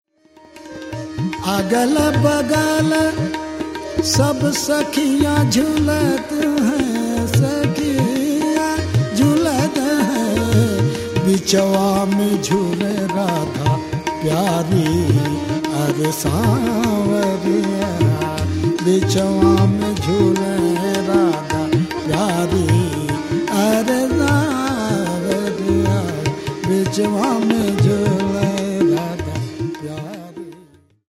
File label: Jhula Dheere Se Jhulao